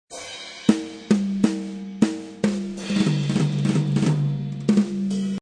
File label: Jazz drum fill at 180 bpm.; Jazz Drum Fill Loop 180 bpm; Drum Loops